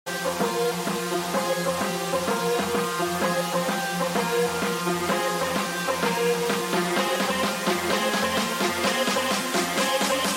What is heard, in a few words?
trance ringtone